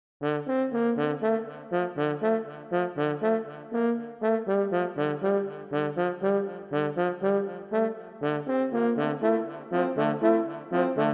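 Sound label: sea monsters brass french horn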